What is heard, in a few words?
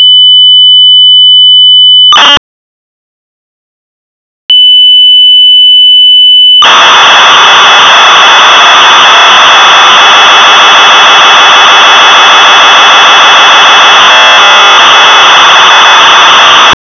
PIANO